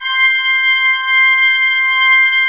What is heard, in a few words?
C5, Cathedral Organ, MANUAL PLENO; Propeller Island